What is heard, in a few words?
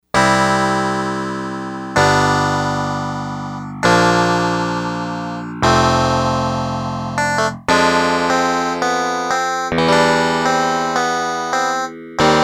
Clear Clav, Yamaha DX100; Factory Patches